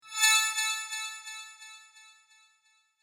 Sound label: Game Spell Of Light, video game